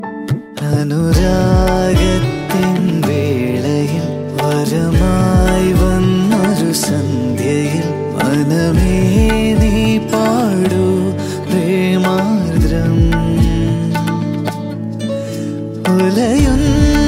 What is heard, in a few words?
Malayalam